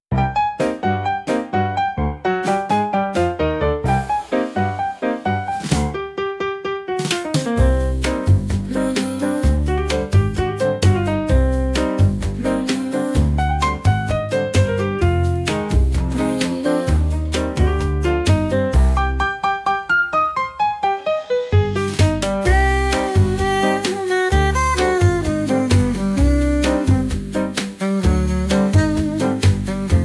Relaxed Jazz, ピアノ・ベース・ドラムが織りなす、おしゃれでアップビートなジャズ。BPM120-130の心地よいリズム; 上級者向けのおしゃれジャズ｜表現力が光る新体操オリジナル曲; Rhythmic Gymnastics Music Shima; ピアノ・ベース・ドラムが織りなす、おしゃれでアップビートなジャズ。BPM120-130の心地よいリズムですが、ジャズ特有のノリを新体操で表現するには高い技術が必要です。